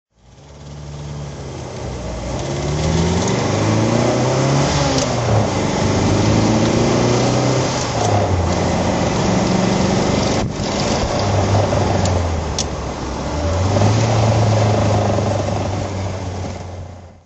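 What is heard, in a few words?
triumph tr4a drive, engine sound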